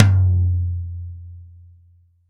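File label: LD Mid Tom, Roland BK-5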